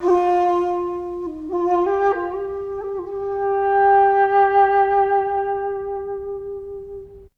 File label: FLUTE; ZERO-G; Datafile